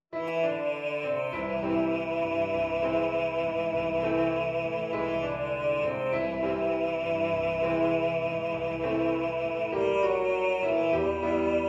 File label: voice and piano